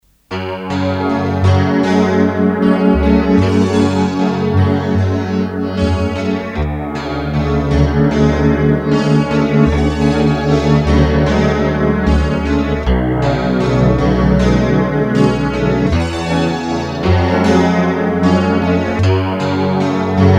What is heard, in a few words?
DX7's Pads